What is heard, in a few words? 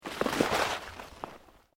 Underbrush Dead Move, Rustling; Material components for constructing more complex sounds; Rustling Sound Effects; Objects